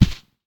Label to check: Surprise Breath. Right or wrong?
Wrong